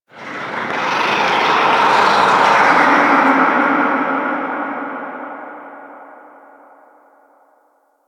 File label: Moon Whispers Medium, Ambience; Warframe; Orokin